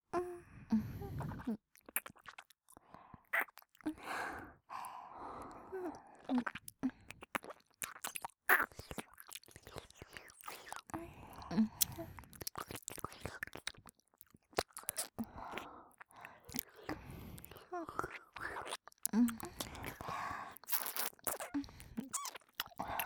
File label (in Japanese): ダブル耳舐めエンドレス; 左右反転